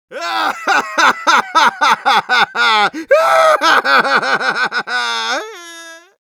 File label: laugh long, ru; Продолжительный смех 2